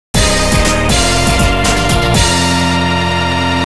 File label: Game Clear; Danny Phantom Urban Jungle